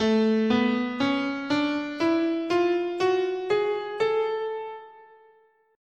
A Bebop Major, scale

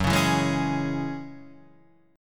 F sharp 7th Suspended 2nd F sharp; F#7sus2 Chord {2 4 2 1 2 4} strummed

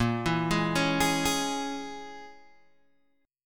Bb Minor 7th Flat 5th, Guitar, Open G

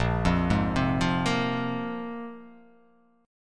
A sharp 7th Suspended 2nd, guitar chord

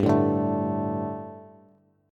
G Suspended 2nd G, down, Bass; Gsus2 strummed; JGuitar

